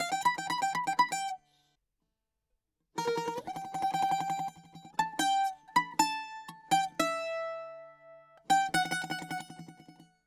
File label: bouzouki